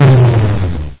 power down; XDM; buttons

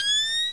mortar call, weapons; XDM

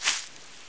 grass; XDM

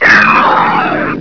zombie pain; XDM

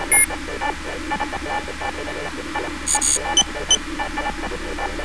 computalk; XDM; ambience

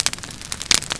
flame burn; XDM

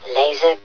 laser; hl1mp; XDM

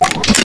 flame select, weapons; XDM

